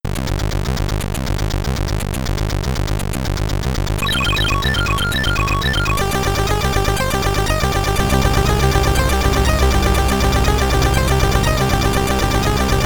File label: Boss Theme (SID Stereo); Altered Beast; Commodore 64; Gaum-Hammer